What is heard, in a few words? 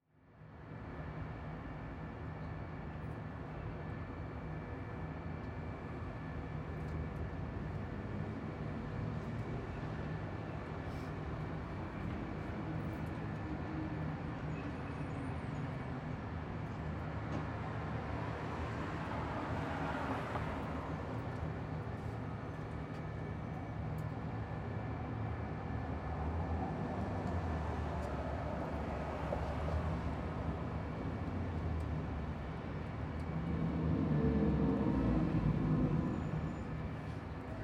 Ambience Bus Stop Near Road; AdobeCC